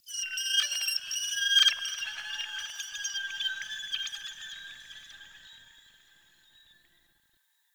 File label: Crystal Bird; Warframe; Tau